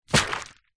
small plastic bag filled with water drop on ground; zapsplat